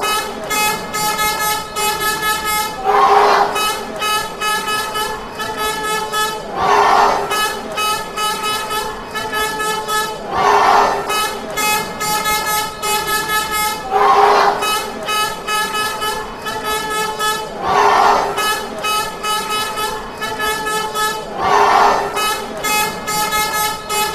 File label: wales clap